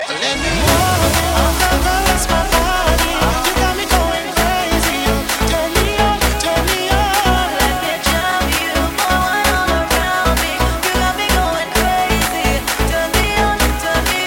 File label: remix; Housefull 2